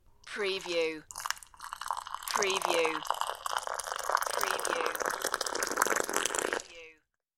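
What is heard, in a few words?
Pour Milk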